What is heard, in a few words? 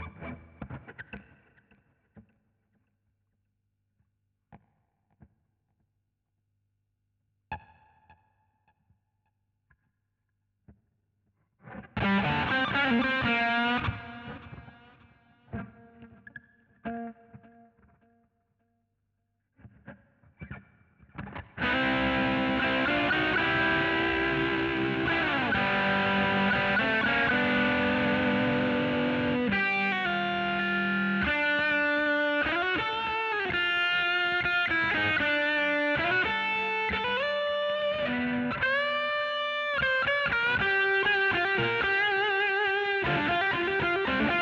GTR B Solo